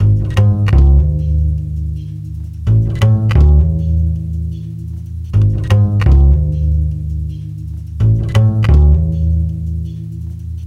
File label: Jazzy Bassline 83